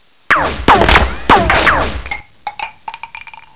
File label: door spray, ambience; caged